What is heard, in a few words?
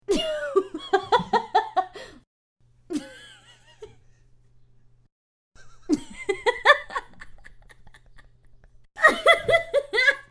laughing sound Female